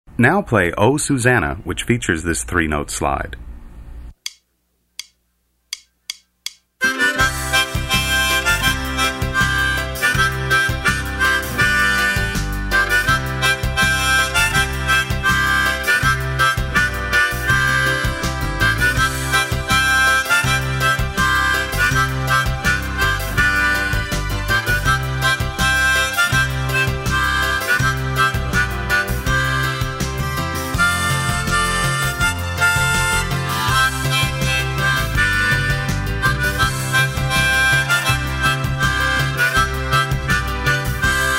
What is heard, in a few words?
susanna, harmonica